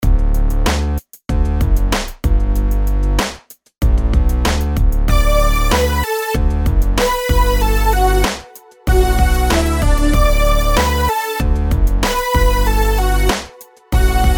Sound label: rhythms simple 8s major